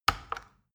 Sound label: plastic button tiny click heavy slow